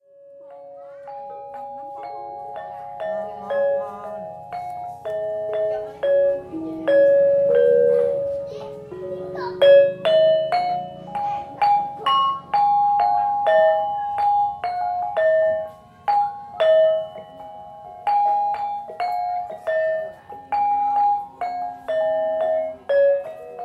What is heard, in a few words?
batik gamelan jam yogyakarta; geocyclab